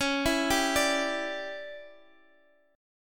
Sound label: D Major 7th Suspended 2nd Suspended 4th Db